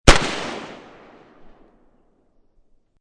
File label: AK47 Bridge; Assault Rifle; Assault Rifles; Guns; VRSonic